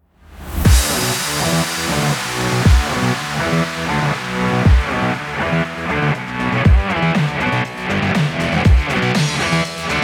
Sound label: stock music